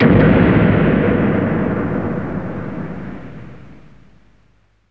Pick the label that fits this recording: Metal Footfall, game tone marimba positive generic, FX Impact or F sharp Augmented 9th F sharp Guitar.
FX Impact